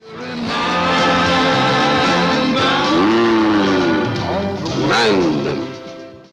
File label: Hmmm; Mandom